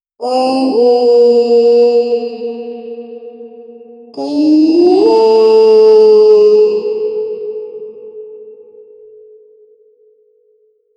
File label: a long whale chant that